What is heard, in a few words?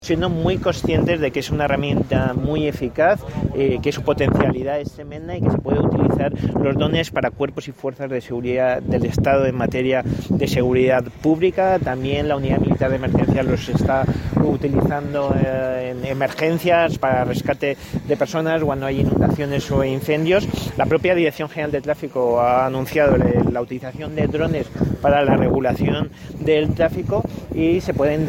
drones